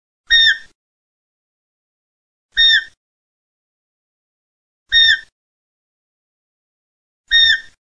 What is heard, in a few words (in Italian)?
CAPRIOLO